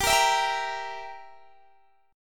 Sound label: G Minor 6th, Guitar Open D